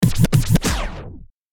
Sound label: DJ vinyl scratch